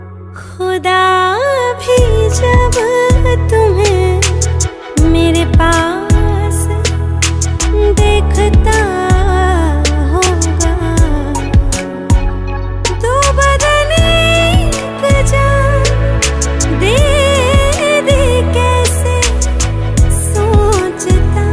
Female Song Ringtone

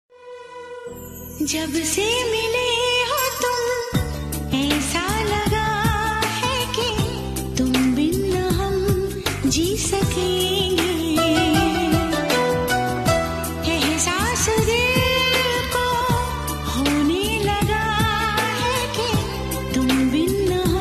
Hindi Ringtone

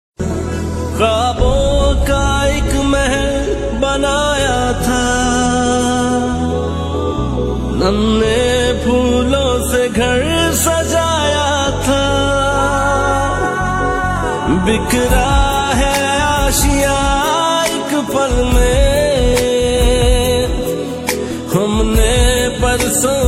New Sad Ringtone

